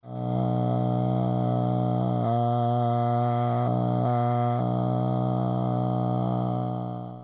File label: voc fry